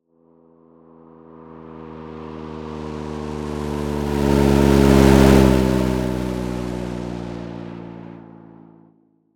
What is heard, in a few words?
Move Biplane; Tsugi